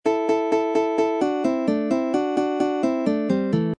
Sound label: double stop riff